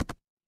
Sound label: footsteps single